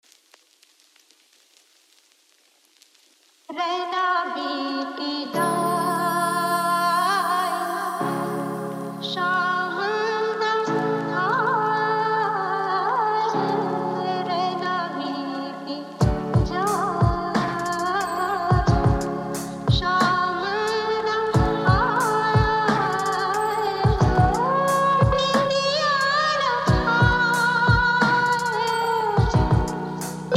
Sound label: lofi